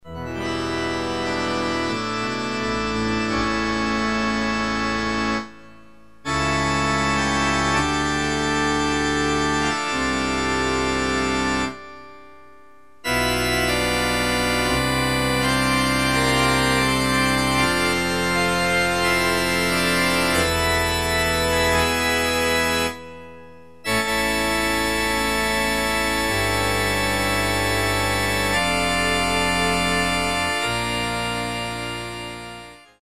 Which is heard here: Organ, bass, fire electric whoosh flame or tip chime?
Organ